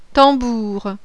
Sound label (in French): tambour; JOUER DE LA MUSIQUE